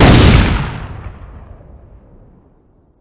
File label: supershotgun shoot; weapons